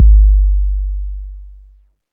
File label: warm kickdrum g key